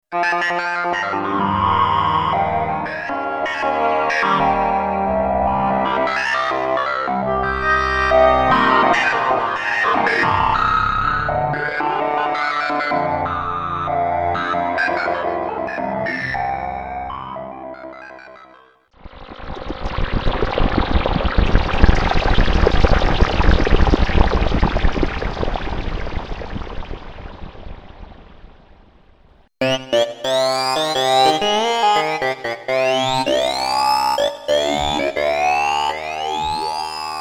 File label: formantik various, synthesizers